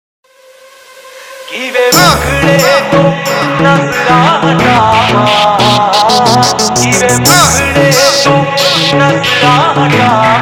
Bhojpuri Dj Song

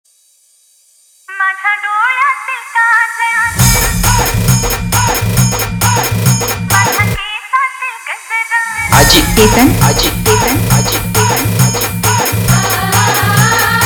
Marathi Remix

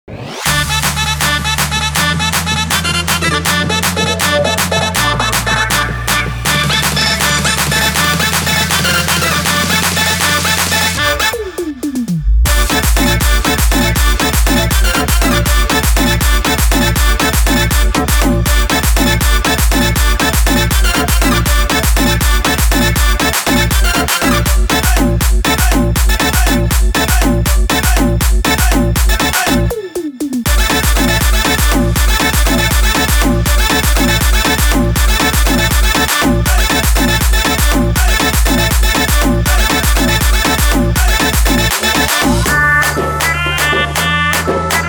Party Anthem Remix

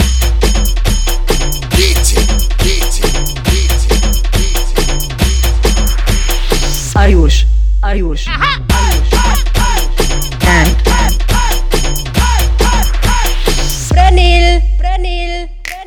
Repeat Mode Dhol Mix

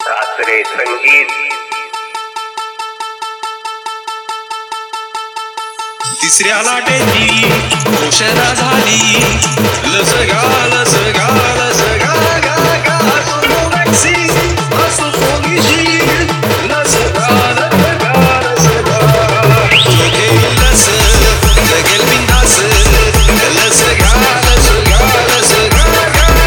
Marathi Remix

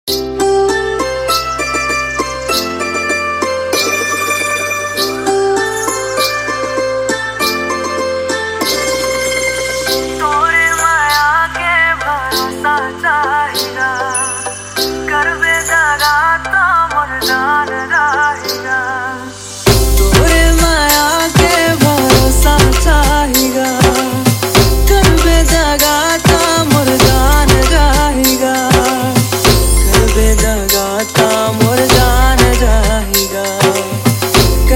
Chhattisgarhi Cg Remix

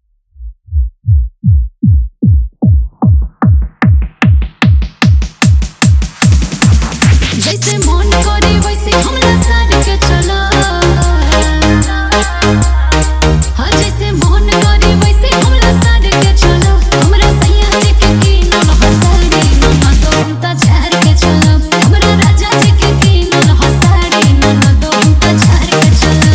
Remix Drop Electro Bhojpuri Dj Song

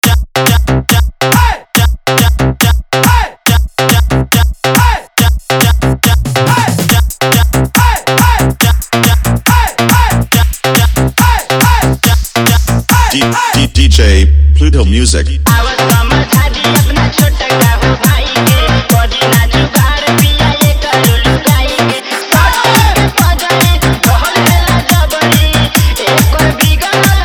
Bhojpuri Remix Song

